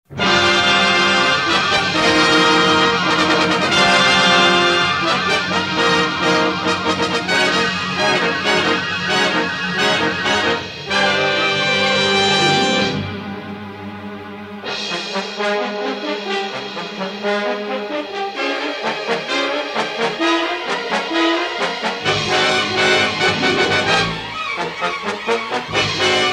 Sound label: Chase medium